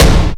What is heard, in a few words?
hit, PERC; Techno Trance Essentials